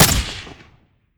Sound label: weap sup plr, weapons; gmod